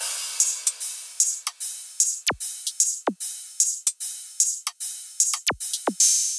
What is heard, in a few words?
Drum Loop 150 Top; Loops; Ghosthack - Color Bass Essentials